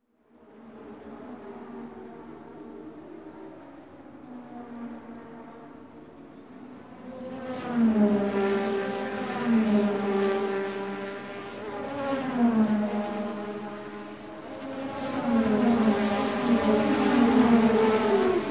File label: Formula 1 Race; Sound Ideas; Series 2000